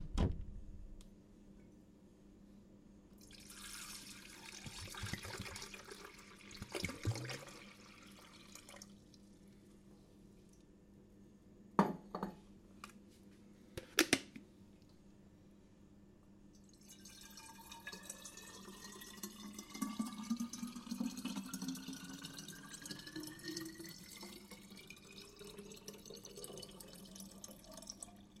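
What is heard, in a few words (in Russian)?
опустошение бутылки с водой в большую емкость а затем повторное наполнение бутылки; 1 litr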